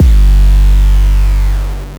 Kick Dist 808

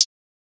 Hat, Closed Hats

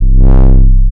Bass

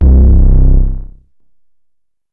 Bass, 808s

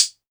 Hat Closer, Closed Hats

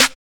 Open Snares

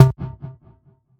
tom one shot low verb; Percs

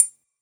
Metallic, Percs

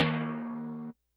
perc

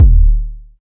Kick

